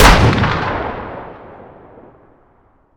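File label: weapon dmr fire player atmo ext; gmod; viper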